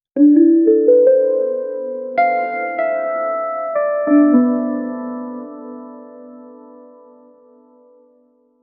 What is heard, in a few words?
soft medieval harp solo